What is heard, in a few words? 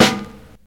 phat snare sample g sharp key; snare drum one shots